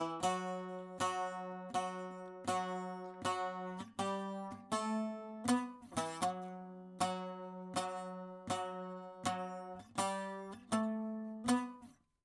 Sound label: saz